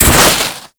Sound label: Heat Gun Hit; Warframe; Grineer